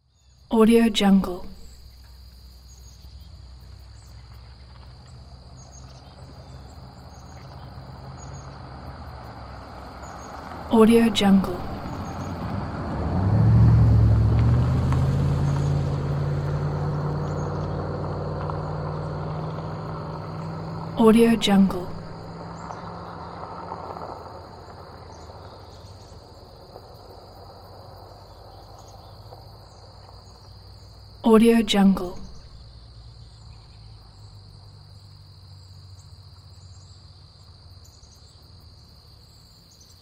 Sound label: forest near road